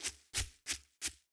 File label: Ice Deer Walk